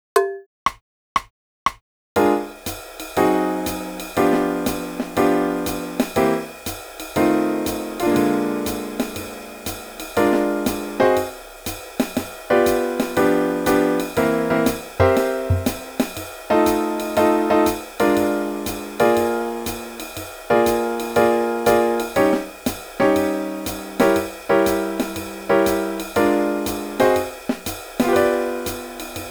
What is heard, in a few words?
no bass